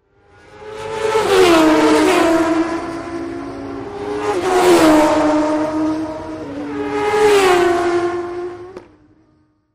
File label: Motorcycle Road Racing